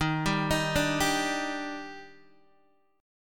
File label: D sharp Major 7th, Guitar DADGAD